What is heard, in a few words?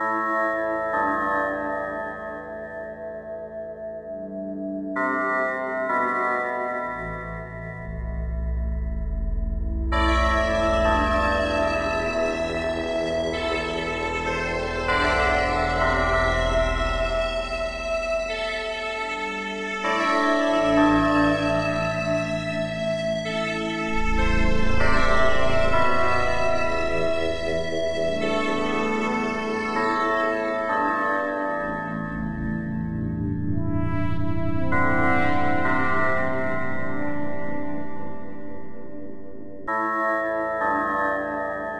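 antenna ambience loop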